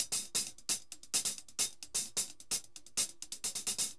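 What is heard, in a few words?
hi hat, merengue; Best Service ProSamples vol.14; AKAI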